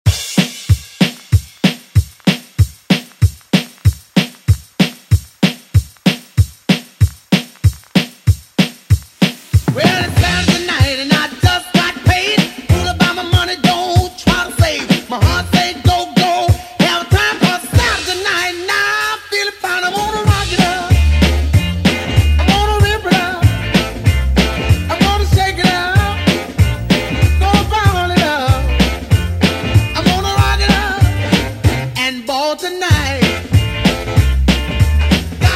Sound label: Re Drum; DJ Mhark